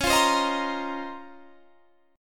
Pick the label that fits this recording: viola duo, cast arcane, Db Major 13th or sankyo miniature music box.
Db Major 13th